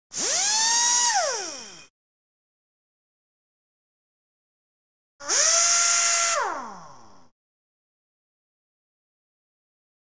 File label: Power Drill